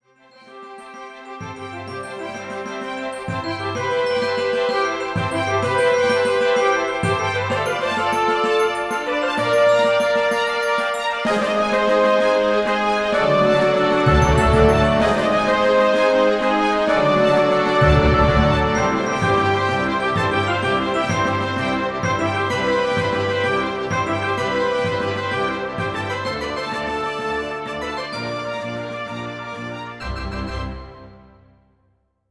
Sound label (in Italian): orchestra eroico